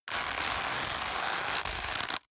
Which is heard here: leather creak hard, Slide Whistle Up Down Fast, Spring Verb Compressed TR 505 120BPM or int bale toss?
leather creak hard